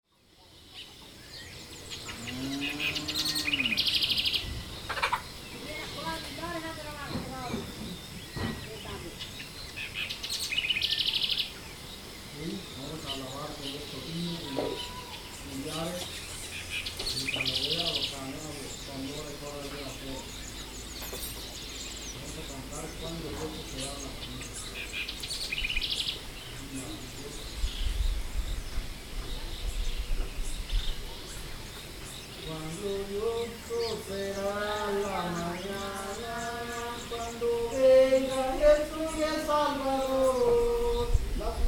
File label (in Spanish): Soundscape - Culto la Casona arriba